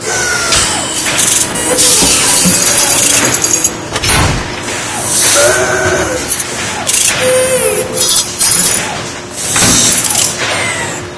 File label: machinery combo lp, industrial; video games; ambient